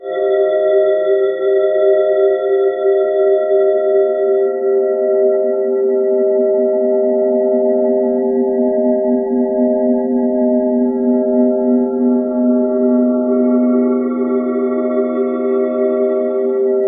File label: Ominous Drones; USB Soundscan vol.48; AKAI